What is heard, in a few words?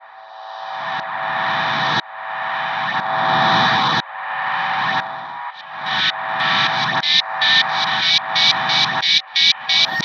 reversomatic, weird; Zero-G Ambiosis